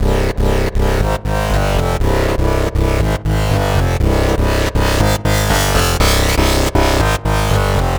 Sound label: Metal Bass; Sonic Foundry (Sony Creative Software); The Electronic Music Manuscript Collection; Bass Foundations